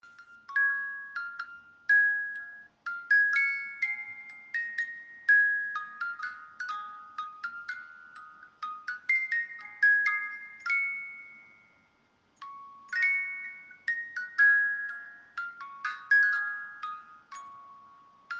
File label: Brahms Lullaby